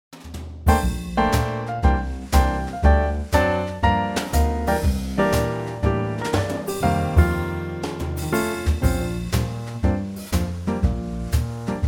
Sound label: Bb Trio